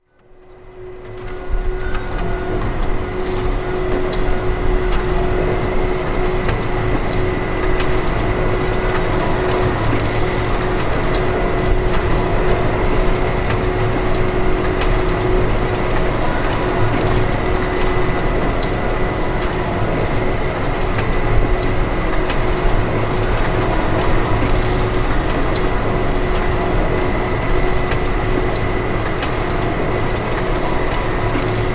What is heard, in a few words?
machine Factory